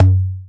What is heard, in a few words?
PERC FX, SYNTH PER; PERCUSSION